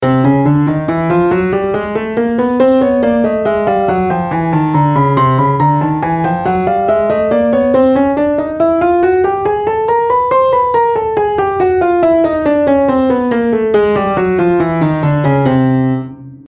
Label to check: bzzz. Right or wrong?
Wrong